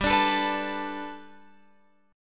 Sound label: G Major Add 9th Guitar Open F, chord; Gadd9; strummed